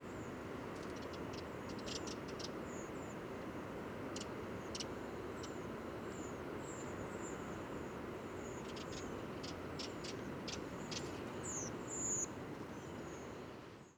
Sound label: rattles, redwing